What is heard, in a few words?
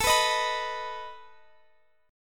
A Suspended 2nd Flat 5th, Guitar, Drop D